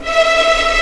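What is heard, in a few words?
E5 tremolo p violin right, violins tremolo; East West; The Ultimate String Collection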